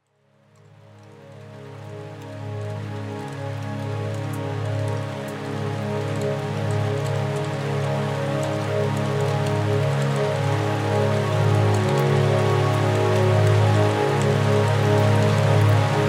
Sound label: Rain